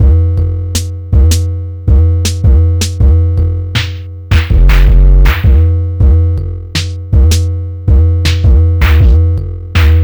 THE BELLS; E-MU Producer Series Vol. 6 – Analogia Project

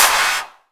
Claps; Vengeance; Essential Clubsounds Vol.4

